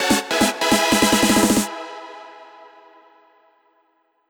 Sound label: melody